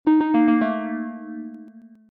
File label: whoopsies